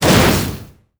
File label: Hit Light Volcano Add; netent